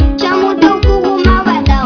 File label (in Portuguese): faca; cstrike; rocinha